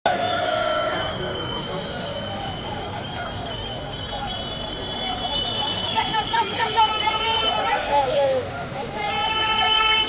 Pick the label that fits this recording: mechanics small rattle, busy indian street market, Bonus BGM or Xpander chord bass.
busy indian street market